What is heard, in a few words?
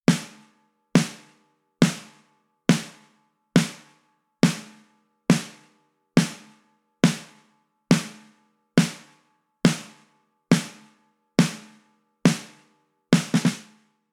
layered sound snare; ssd5